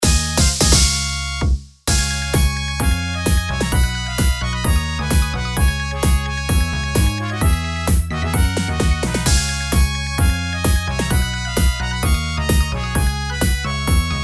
ファンファーレ6（戦闘勝利＋BGM）

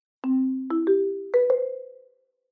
木琴数音

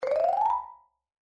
シーンが移る音

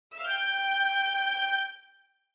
ファンタジー音

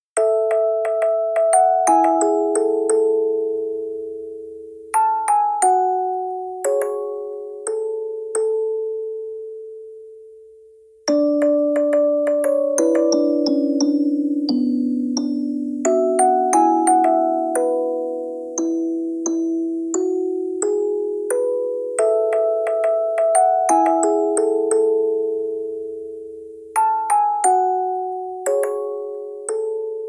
オルゴール

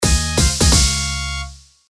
ファンファーレ6（戦闘勝利）

